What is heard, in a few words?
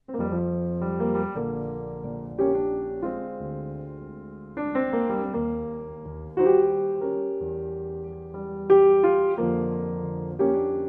Solo Piano